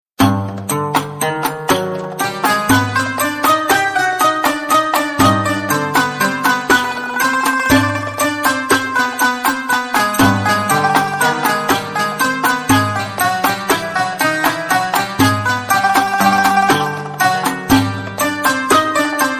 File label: Arabic Ouds; LG KP500N Cookie MEA; Ring tones